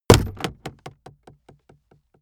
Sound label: aluminium case hit hard